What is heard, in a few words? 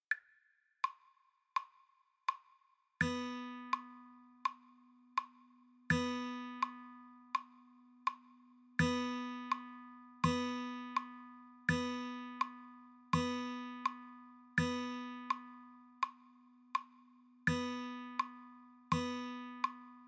Rhythm Practice